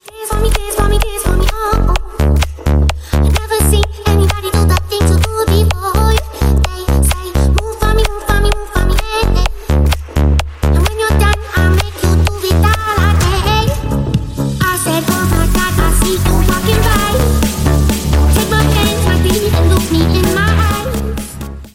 dance ringtone, Xylophone ring tone

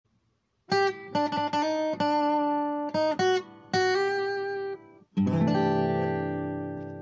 funny country guitar ringtone

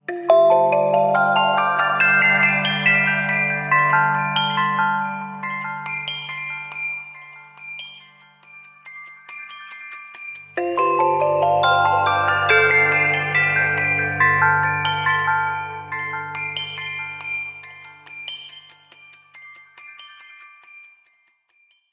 snow falling ringtone; android

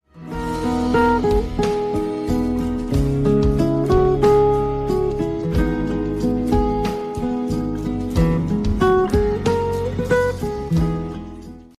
turkish ringtone; android

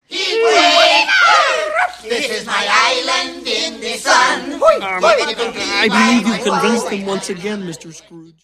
heat wave notification; android

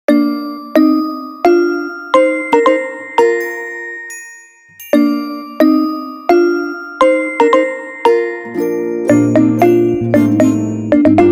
fanatical ringtone; oneplus

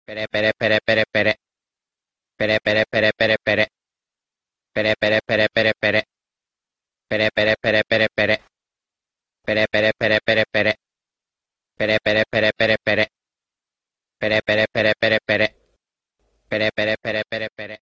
transponder snail ringtone; anime

